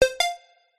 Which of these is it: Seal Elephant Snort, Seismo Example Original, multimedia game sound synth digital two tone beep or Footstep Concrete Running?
multimedia game sound synth digital two tone beep